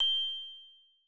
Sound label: glock A; Twilight